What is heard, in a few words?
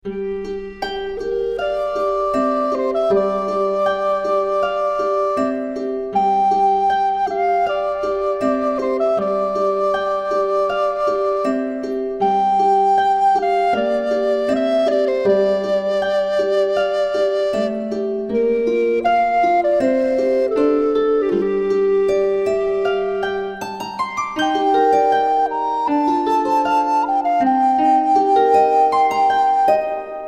BGM